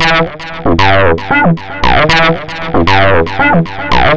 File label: (arp) acid; Sonic Foundry (Sony Creative Software); Ugly Remnants VOL-2